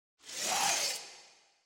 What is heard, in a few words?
designer scifi mechanical servos; SoundsCrate